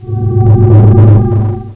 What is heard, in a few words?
FLUX FX; Reflux